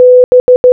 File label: B Morse Code